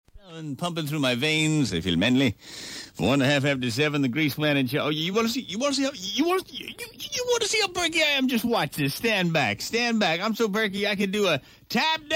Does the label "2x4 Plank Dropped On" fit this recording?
No